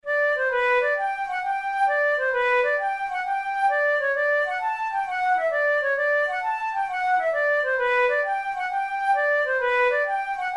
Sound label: hornpipe, flute tunes; Miss Gayton's hornpipe